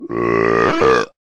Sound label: burp crack